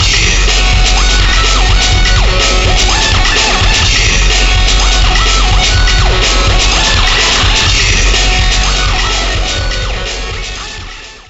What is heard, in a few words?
Ditty; NBA ShootOut 2003; ps1; ps2